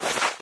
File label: swipe; pda; source engine; tf